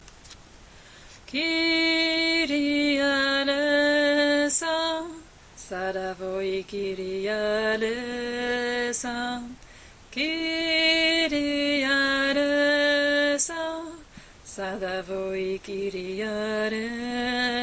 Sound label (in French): basse